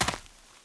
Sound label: dirt